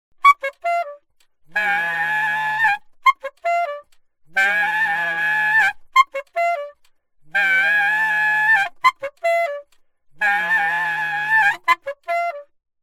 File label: altosax